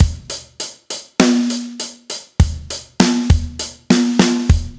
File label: Drums, метал